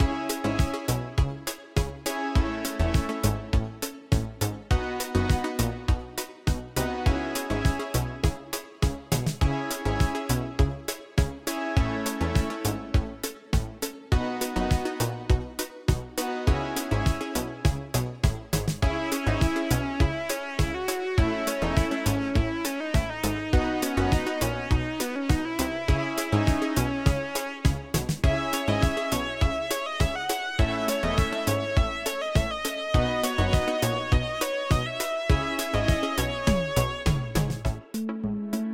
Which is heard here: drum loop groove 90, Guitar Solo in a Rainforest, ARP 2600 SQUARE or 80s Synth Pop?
80s Synth Pop